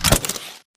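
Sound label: Tick Movement Footstep Jump Land; Titanfall 2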